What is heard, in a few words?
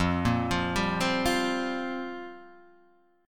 F Suspended 2nd Suspended 4th F; Fsus2sus4 Chord {1 1 3 0 1 1} arpeggiated